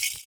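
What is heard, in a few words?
PERC, percussions; Univers Sons - Raricussions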